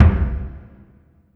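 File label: Burundi drum, Heart of Africa; Spectrasonics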